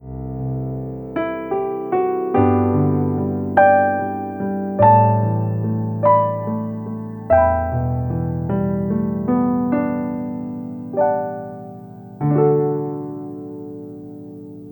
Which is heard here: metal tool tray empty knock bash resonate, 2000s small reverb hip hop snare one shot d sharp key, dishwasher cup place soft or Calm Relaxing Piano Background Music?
Calm Relaxing Piano Background Music